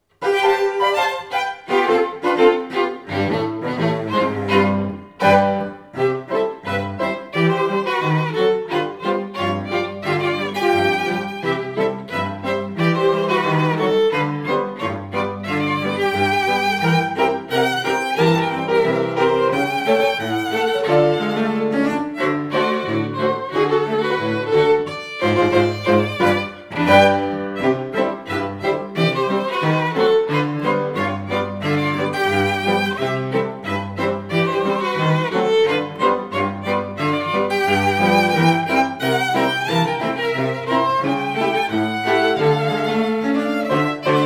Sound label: quartet